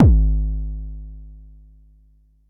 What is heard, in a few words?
Bassdrum, Jomox Xbase09